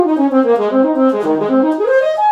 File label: sax; blocks neural instrument coding